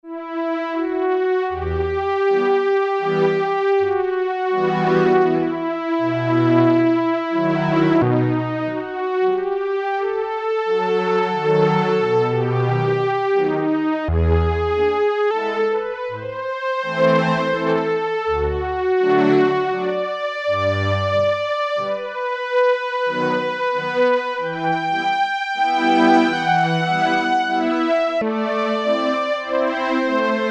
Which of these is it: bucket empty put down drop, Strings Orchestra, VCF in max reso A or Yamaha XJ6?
Strings Orchestra